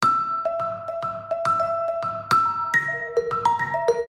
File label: Crystal Bunga Low Ringtone